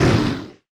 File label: hit